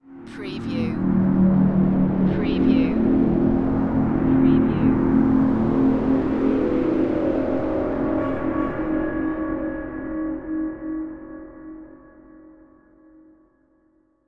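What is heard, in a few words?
Production Element Distortion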